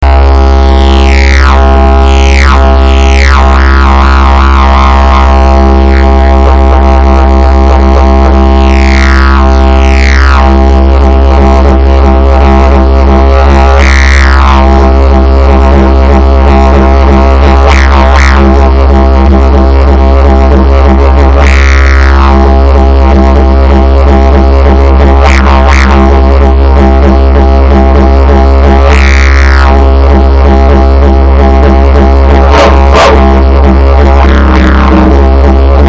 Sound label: didgeridoo